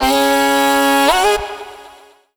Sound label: Vocal one shot Db; Slate Digital Omnivox Vocal Sample Pack